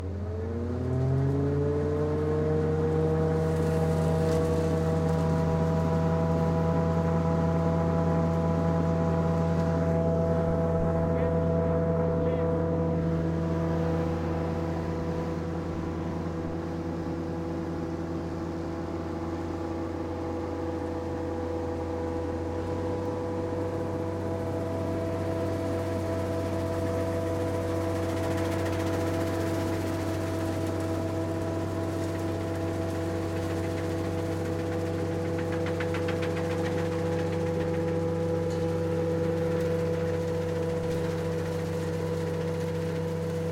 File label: olive harvesting machine